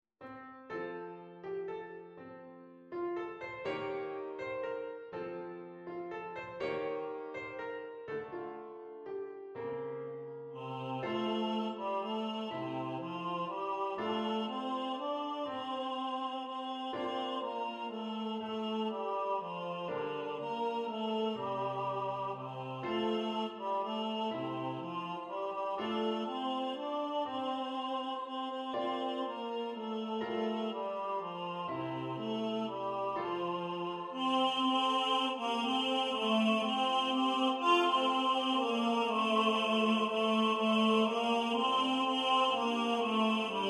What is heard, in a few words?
If I Was A Blackbird TENOR